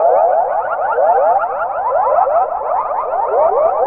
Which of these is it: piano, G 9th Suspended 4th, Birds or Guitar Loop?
Birds